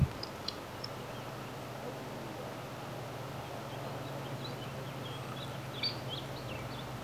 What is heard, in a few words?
at lake